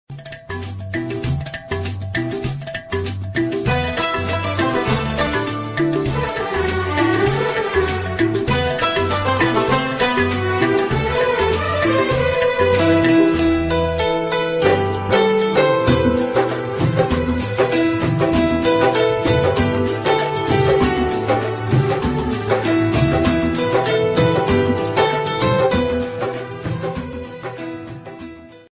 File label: love ringtone; srk; shahrukh khan ka ringtone